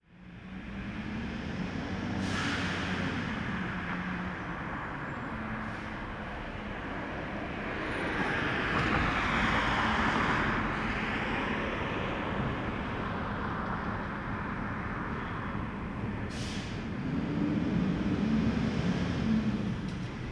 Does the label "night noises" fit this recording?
Yes